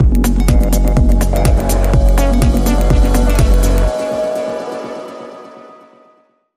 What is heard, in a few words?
Link Sting